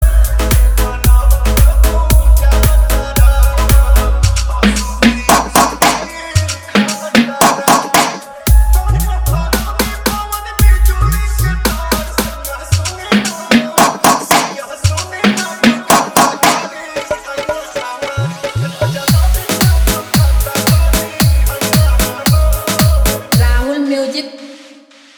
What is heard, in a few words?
Bhojpuri Dj Remix Song Edm Drop Mix